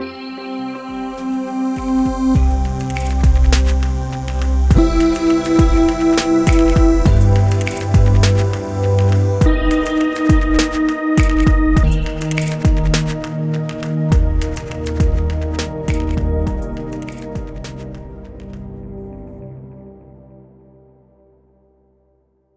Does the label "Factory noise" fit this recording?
No